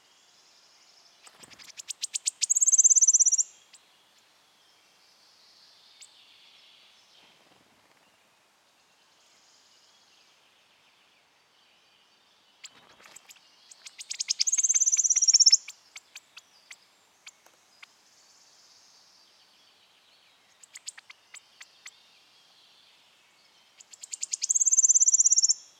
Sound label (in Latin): Ph. sibilatrix